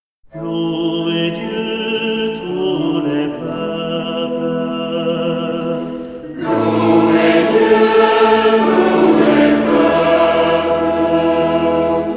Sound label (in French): Alleluia irlandais